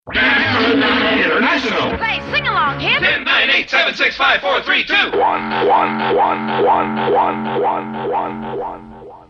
Radio Caroline Jingle 10, 9, 8, 7, 6, 5, 4, 3, 2, 1